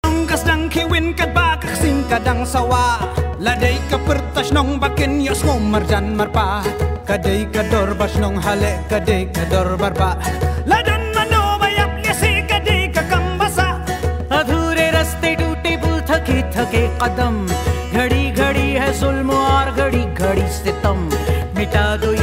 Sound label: ringtone